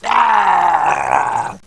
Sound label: pain